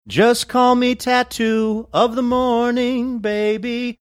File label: singing just call me tattoo of the morning baby